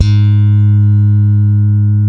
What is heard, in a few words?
Bass, G#3, ALL THUMBS; Spectrasonics; Bass Legends